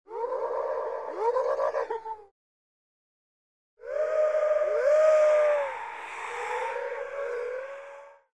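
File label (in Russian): briefly laughs and dissolves into the darkness; Для Хэллоуина; Коварный злой смех старой ведьмы, забавный смех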